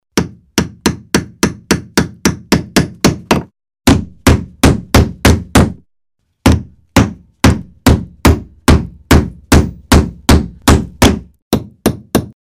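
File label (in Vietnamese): âm thanh tiếng đóng đinh trên ván gỗ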